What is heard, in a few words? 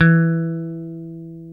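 guitar, Dan Electro, 6 string; Dan-O 6 Str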